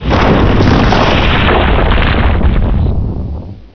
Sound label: rock collapse